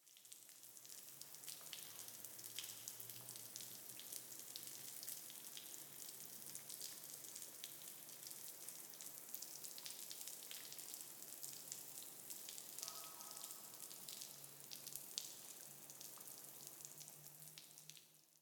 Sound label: Water Spray Drops Streaming Against Cement Water Hose; Hollywood Edge; sound designer's toolkit v2